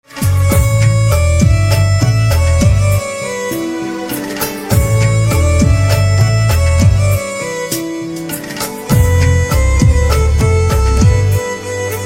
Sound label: Harmonica Instrumental Ringtone